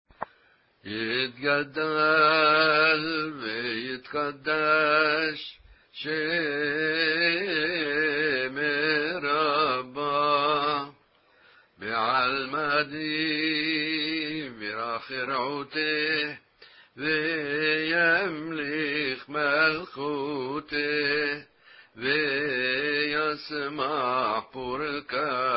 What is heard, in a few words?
qaddish ajam